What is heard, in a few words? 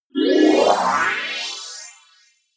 appear sparkle long